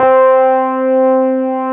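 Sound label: Wurlitzer C3 - R; USB Soundscan vol.09; AKAI; Keyboards Old School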